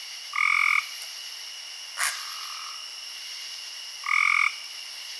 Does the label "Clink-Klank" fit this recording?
No